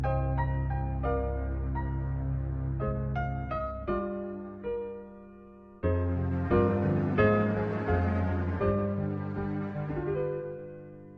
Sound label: Lullaby